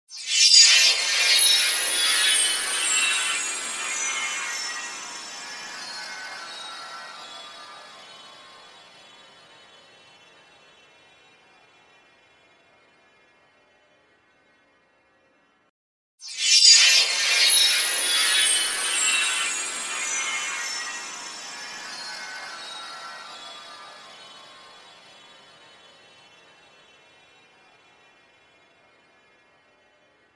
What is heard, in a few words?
Tinkerbellish Around Head Spell; Back In Time